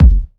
original kick sound a sharp key, Royality free kick one shot tuned to the A# note; kick drum samples